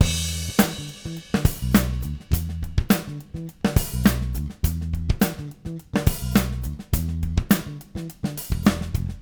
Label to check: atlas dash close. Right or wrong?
Wrong